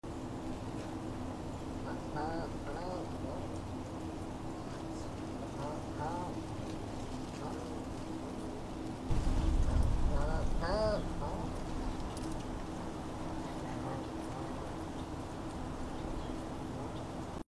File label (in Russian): manul miau